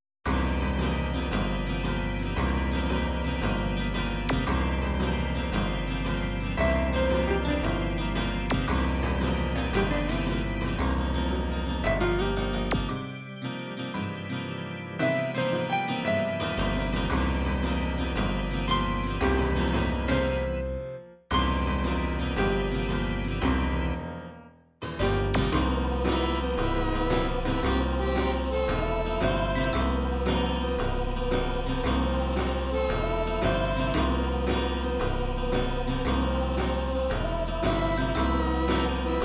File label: country, midi